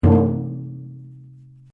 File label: RUBBER ON METAL HOLLOW; Materials